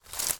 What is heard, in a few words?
footstep into bushes; foley